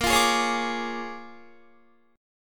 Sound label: Bb 7th Sharp 9th Bb Guitar, chord; Bb7#9; strummed; JGuitar